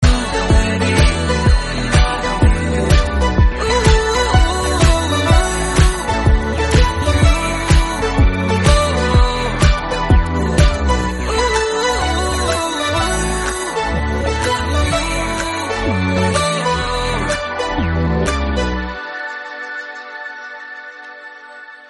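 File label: background music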